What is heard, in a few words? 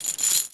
chain movement; pudge